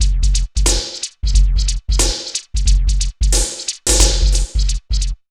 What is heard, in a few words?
Synth Loop, BPM 90